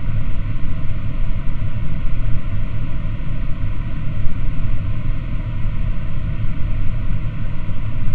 extract fan, Aircraft A320 - 321

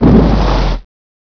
ENGINE DETACH, Aircraft A320

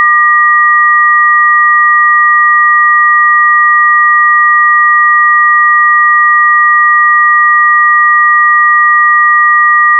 BAT2 TONES, Aircraft A320

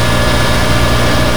External Push Back Cart, Aircraft A320

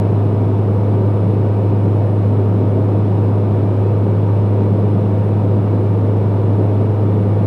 flaps 160 KTS 11500 FT, Beech 1900

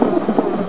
WIPERS LOW SPEED, Aircraft A320 321

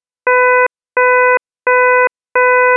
Gear Warning, Aircraft, Beech 1900

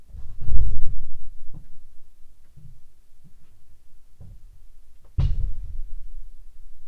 opening right cabin door, A320 - 321 Aircraft

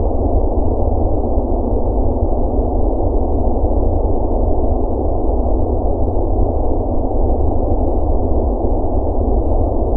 Afterburner, F-18

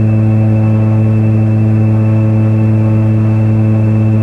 Prop Max Pitch

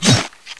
knife hit, Weapons

